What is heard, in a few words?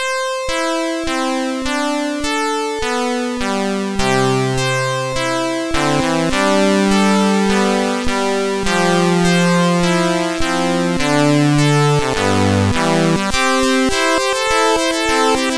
Super Synth Sample, sid CSG8580R5